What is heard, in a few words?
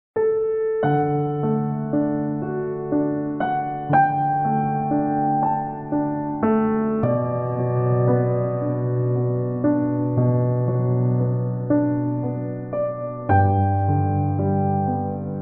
calm soft piano music